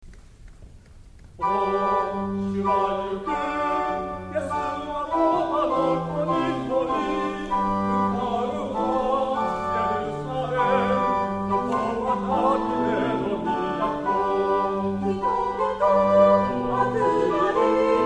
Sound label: Choir Hosanna son of David; K Shalom Choir